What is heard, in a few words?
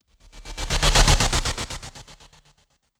Strobe Transition